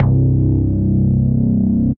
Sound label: Crazberry Punch, Bass; Ableton; Factory Packs; Chop and Swing